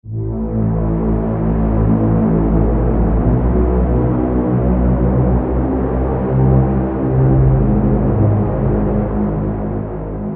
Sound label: pad; Dust Serenade; Routed Sound; Vital Expansion - Doom Carpenter Vol.1